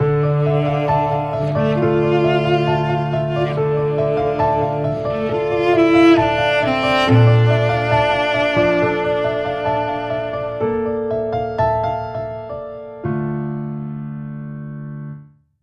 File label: Intimate